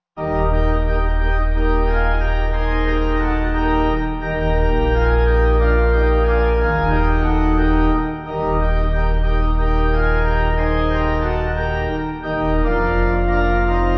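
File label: Pipe, Pipe Organ backing; G.C. Stebbins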